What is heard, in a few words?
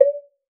WATER; Xsample VOL-9